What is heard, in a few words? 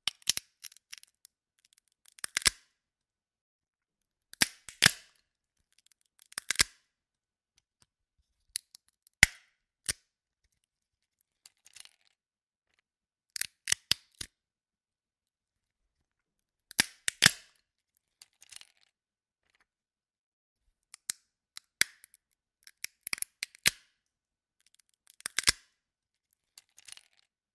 cracking walnuts; شکستن گردو